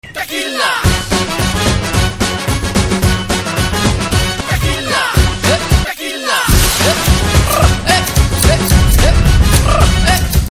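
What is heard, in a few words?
Trailer background Ringtone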